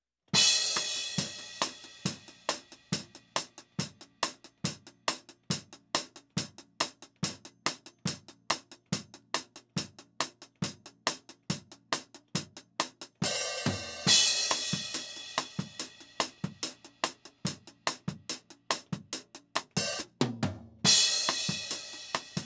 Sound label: Hat